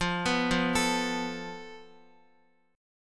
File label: F Major Flat 5th, Guitar; arpeggiated; 4F,4A,5C,5G,5C,6E; x,x,5,4,5,5; JGuitar